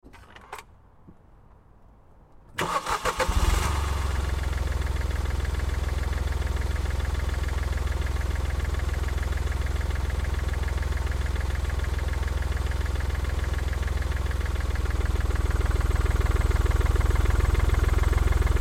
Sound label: Car Smart Car Start Idle Stop; Car , 2005 Smartcar Fortwo , Start , Idle , Stop; Vehicles